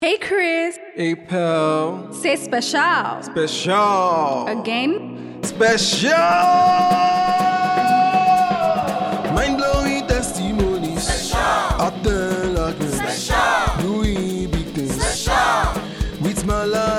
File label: song